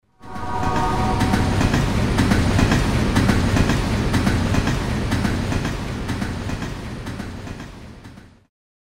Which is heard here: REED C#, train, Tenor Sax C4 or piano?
train